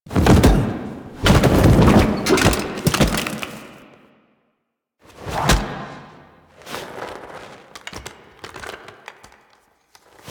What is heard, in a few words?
Foley; Warframe; The New War; Grineer Eidolon